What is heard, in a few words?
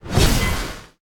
auto swing; smite; vulcan